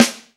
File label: Snare Nicey; Proteus 2000; Digital Sound Factory Proteus 2000